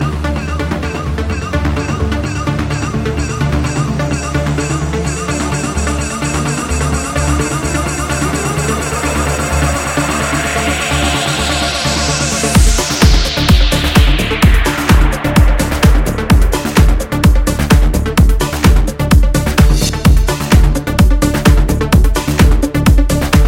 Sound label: Running